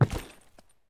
wood footstep

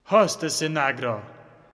agression, satan; customnpcs

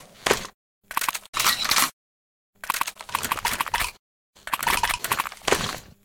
uboinik load, weapon; metrocraft

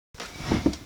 wooden Crate Open

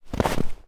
snow footstep

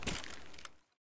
dirt footstep

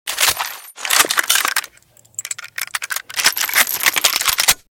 tkb464 load, weapon; metrocraft

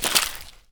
ice footstep

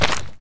mud footstep

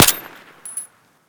vsv shoot, weapon; metrocraft

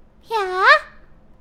Attack, human girl heroic

